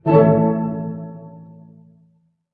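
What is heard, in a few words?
harp hit A major; Sound On Sound - Peter Siedleczek's Orchestra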